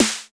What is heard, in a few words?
SNR, Cheez Snrs